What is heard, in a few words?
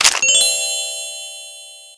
sound life; tank